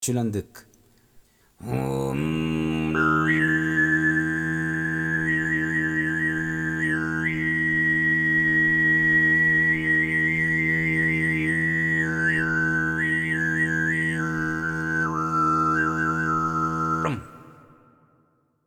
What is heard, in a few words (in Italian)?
audioesempi chylandyk